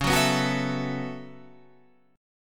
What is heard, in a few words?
B 7th Suspended 2nd Suspended 4th, chord